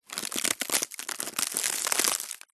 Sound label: foley leaf large dry crunch crush break; zapsplat